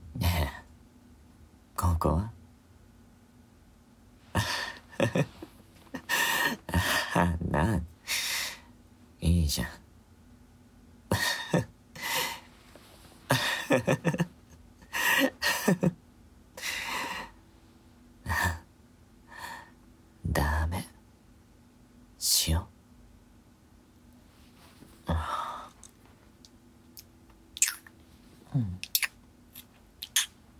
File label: sleep rec